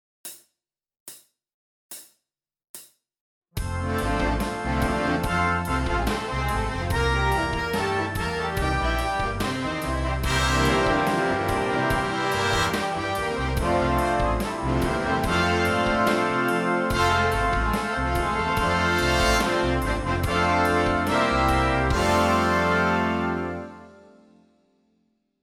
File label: Rhythm Study